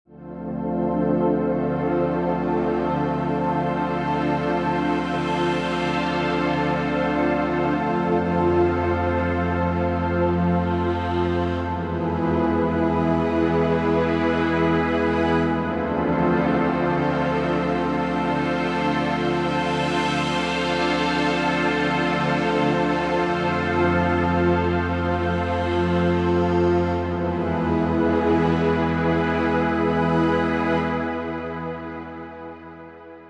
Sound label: wavetable pad; ableton live tutorial